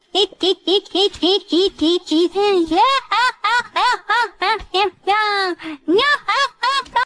vocals; BanG Dream; 唐笑

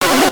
synth

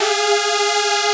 synth

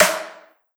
snare1

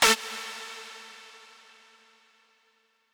lead

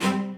strings

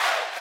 crowd

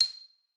xylo

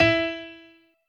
piano

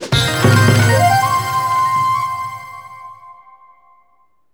TRACK START; maimai

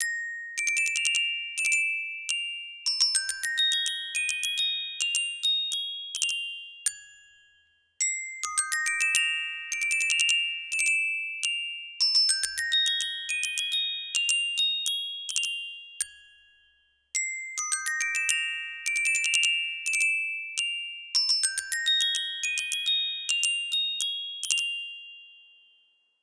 gloken bgm

